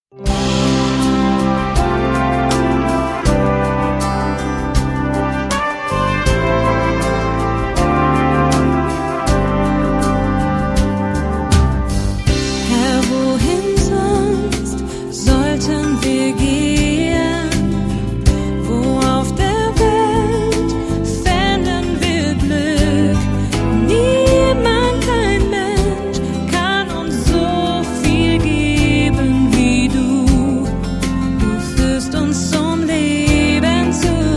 Brass, Studiochor

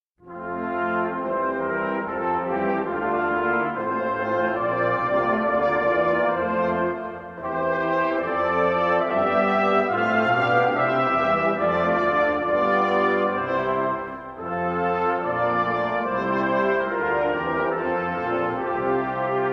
Instrumental

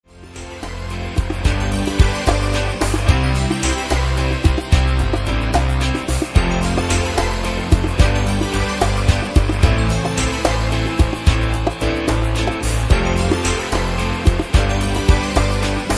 Knesset Song Playback

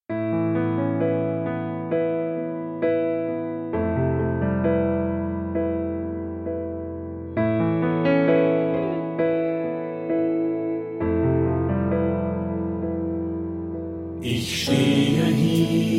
Tenor

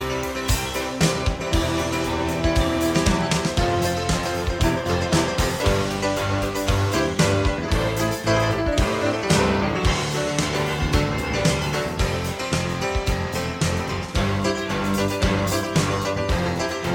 Piano Prelude

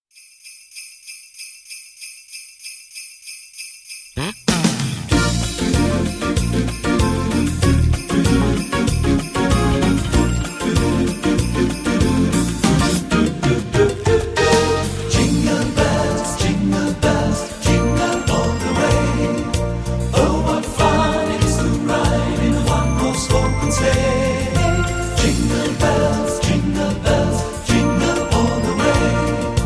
Jingle Bells Maenner

